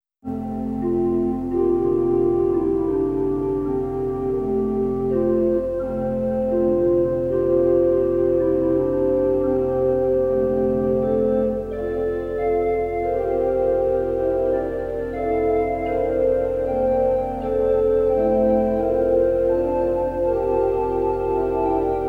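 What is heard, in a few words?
organ chorale arrangement, Hark the Herald Angels Sing; hoor die blye englelied; orrelkoraalverwerkings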